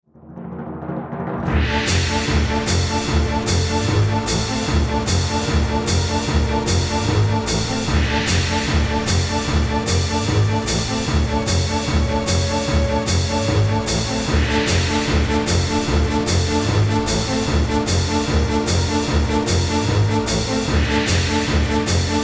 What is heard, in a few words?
Wasteland Medium; Serious Sam 2; Kleer